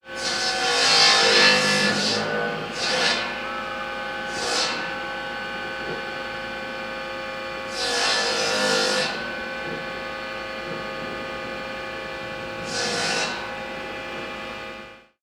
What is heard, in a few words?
Table Saw